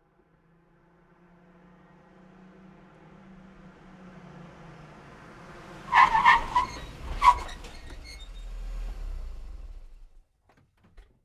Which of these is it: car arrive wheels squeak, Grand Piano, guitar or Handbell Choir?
car arrive wheels squeak